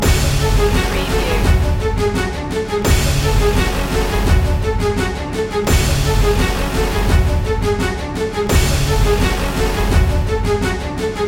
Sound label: besieged loop